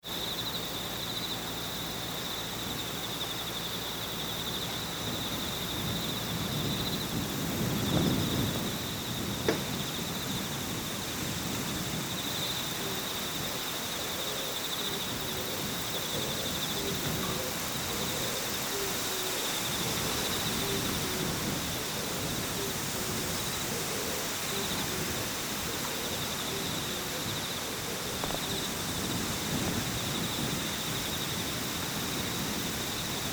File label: pastoral landscape